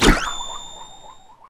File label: coin toss; garrysmod